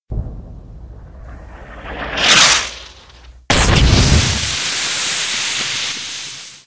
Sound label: gas